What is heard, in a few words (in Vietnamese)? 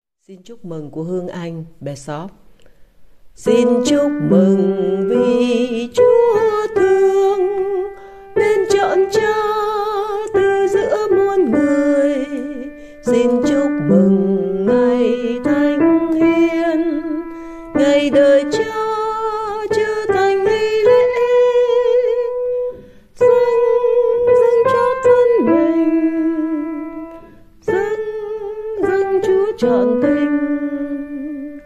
Xin Chuc Mung Sop